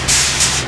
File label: empty trash